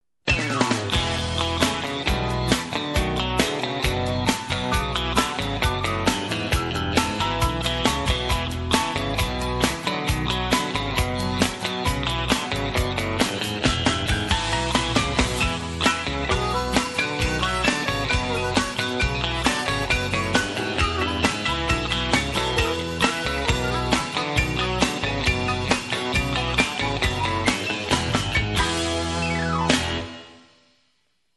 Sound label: Country Rock